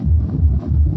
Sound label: sonic idle; quake2; zaero; weapons